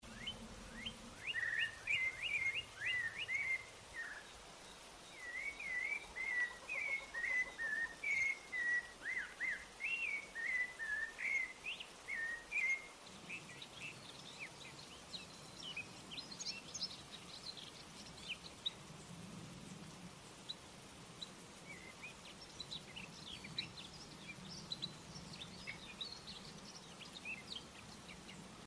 fondo chin

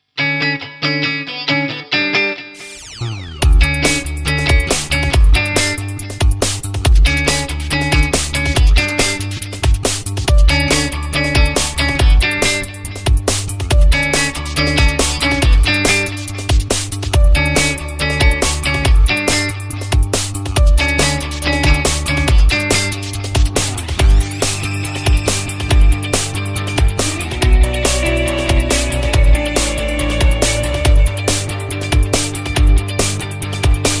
Musica instrumental

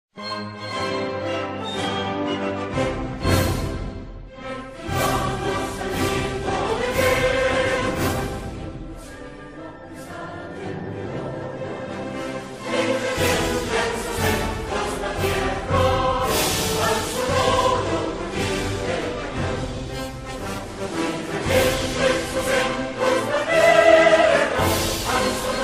Himno Nacional Mexicano Versión escolar

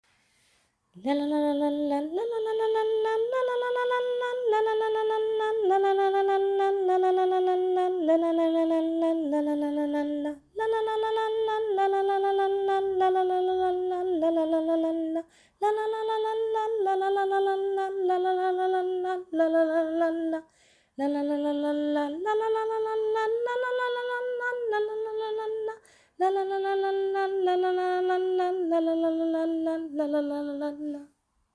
Estrellita variación

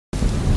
sonido de lluvia fuerte y truenos; para dormir lluvia ultra relajante 11 horas lluvia

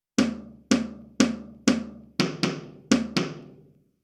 Ritmo

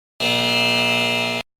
Efecto de sonido Error

